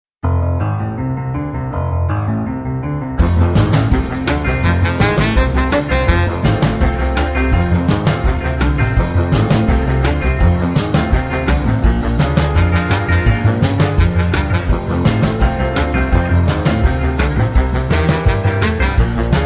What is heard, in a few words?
Boogie Woogie Twist (F) No Backing Vocals